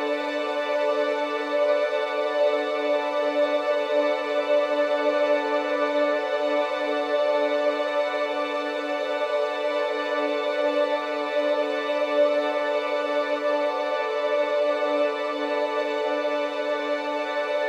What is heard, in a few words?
Looping Pad; homefront gamerip 2011; Oasis